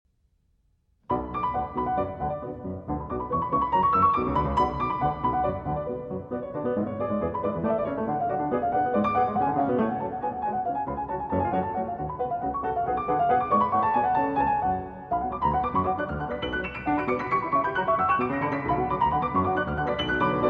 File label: Badinerie from Orchestral Suite in B Minor, for Four Hands; BWV 1067